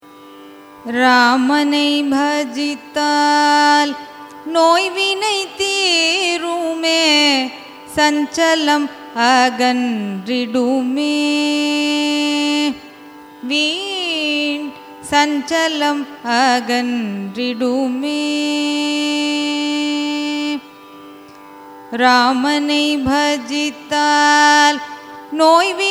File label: raga maand carnatic; carnatic music